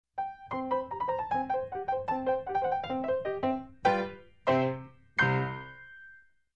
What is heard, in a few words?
Funny Circus Piano Music